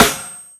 One Shot Snare, Drum N Bass - Percussion; Sonic Foundry (Sony Creative Software); Knutrix Drum & Bass

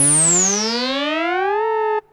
FX, Drum & Bass

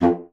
F2, bassoon; Xsample VOL-6; C BASSOON

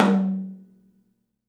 TOM, Studio Toms; 3D Percussives

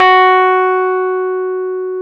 Electric Pianos; Wizoo

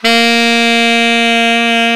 TENOR SAX; Alpine Volksmusik

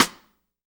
sidesticks; Spectrasonics - Backbeat

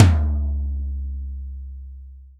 tom, Real Drum Kit, funk kit

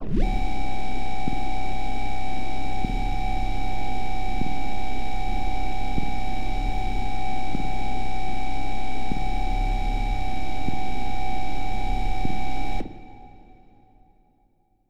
Ambience, pad; M8

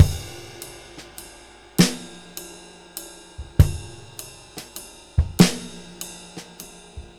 6/8 Loop, Drum Loops Laidback; Sonic Foundry (Sony Creative Software); Drum Tools

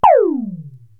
TOM Hi Disco, Korg TR-Rack

